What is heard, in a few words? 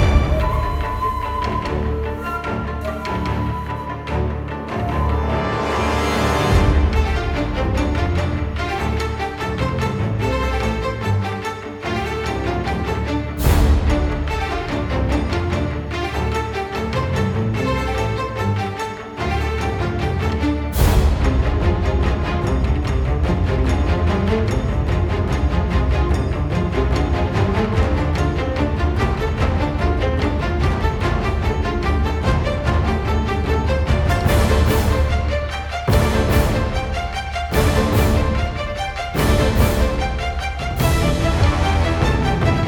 bgmusic battle